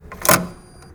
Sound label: card remove, atm